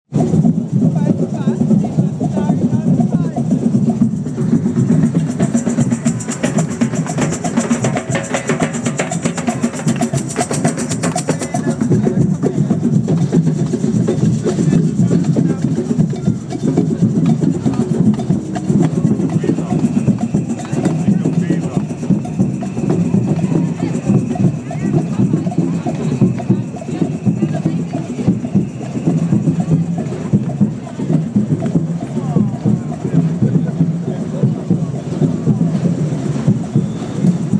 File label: bremen samba carnival